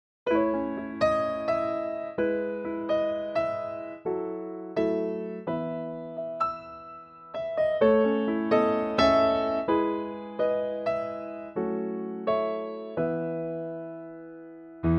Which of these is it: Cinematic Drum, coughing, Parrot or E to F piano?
E to F piano